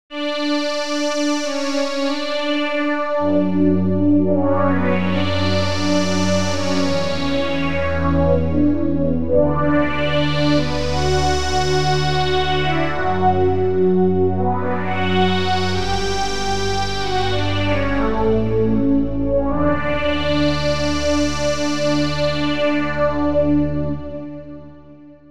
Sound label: pad 4 voices; a new apple